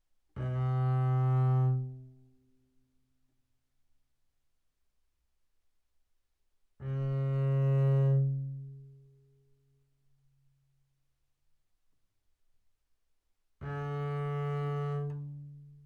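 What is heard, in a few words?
Bass arco sul G fortissimo C3 B3, double bass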